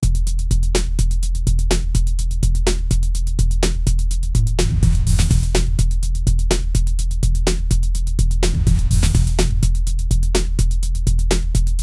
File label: funky bass, groove; 47 second